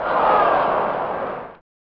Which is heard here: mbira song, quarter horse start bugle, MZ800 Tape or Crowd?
Crowd